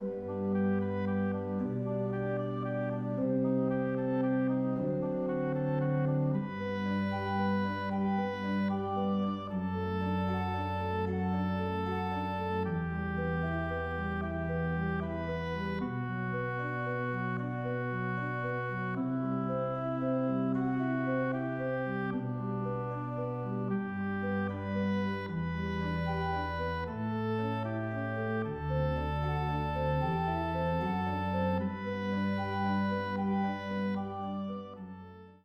Ave Maria orgel

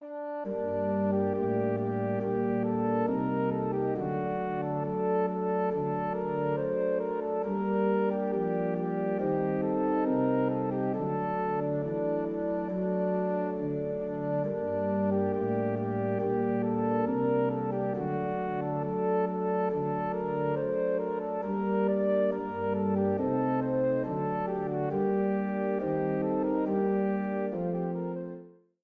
Jamtlandsk brudmarsch

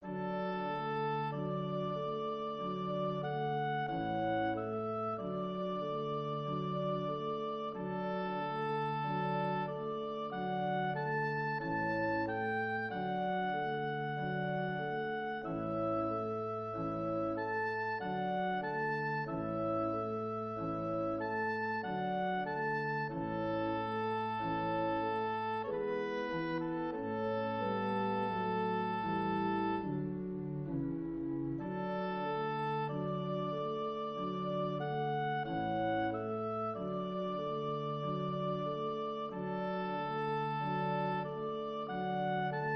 Adagio ur Klarinettkonsert

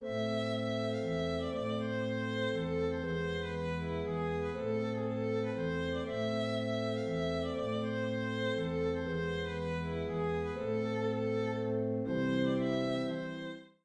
Horgalaten

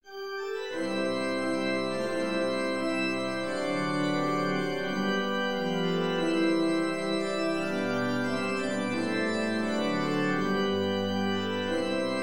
Brudmarsch fran Ore

